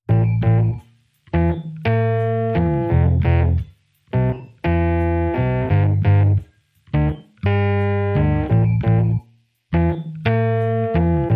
No Brass Swells Mix

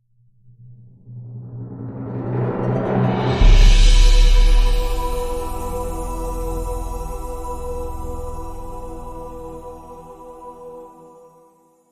Magical Sting

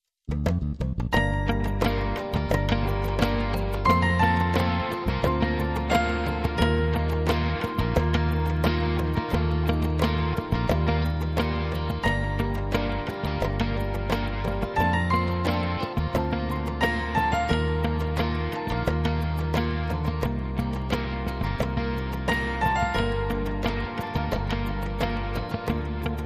No Strings

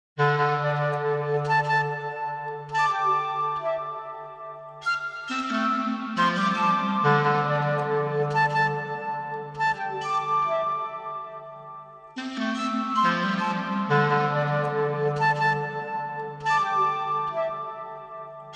Woodwinds Only

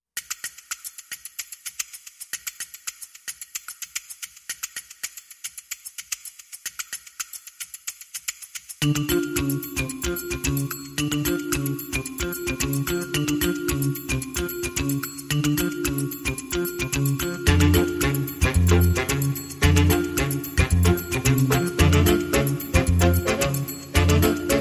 Samba

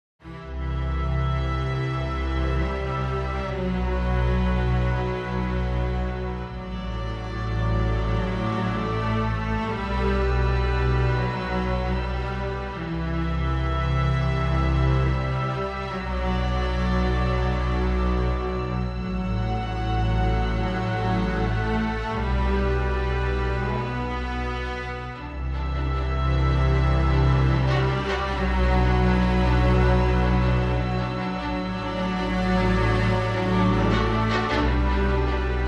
Awaiting Strings Only

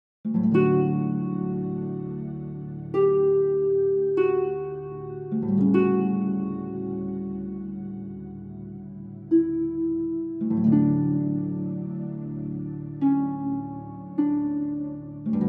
A Beautiful Day, Pads Synth 2

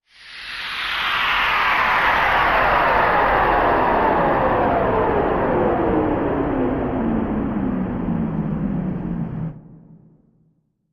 Big Space Wind Down

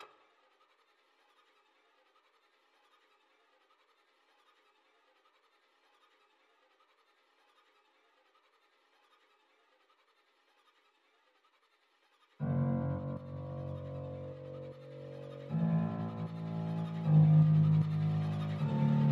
Distortion Ambience

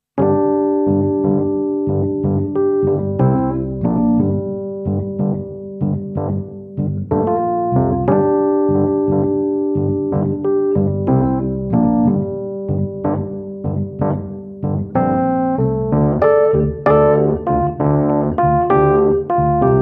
Rhodes

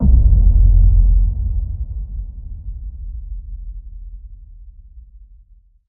Hit Low

